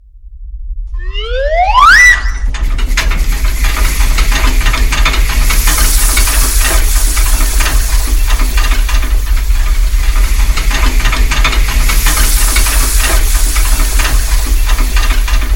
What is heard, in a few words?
match